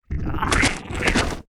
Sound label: WAR POWER UP; ANABOLACYST; Mob